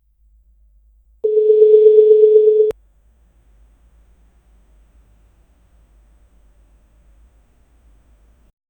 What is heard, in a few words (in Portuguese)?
mensagem chegando na tela, chat